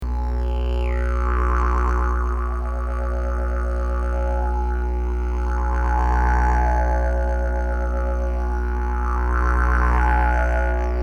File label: didge